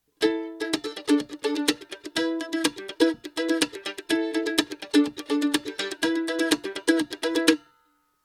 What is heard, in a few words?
mandolin comparison acoustic chords